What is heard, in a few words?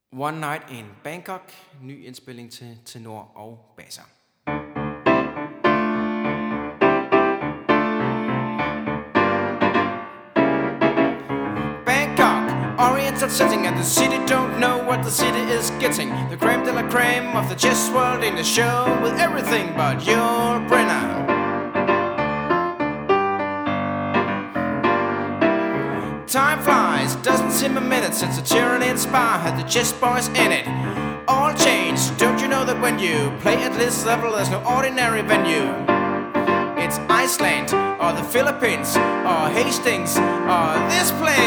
Ten Bas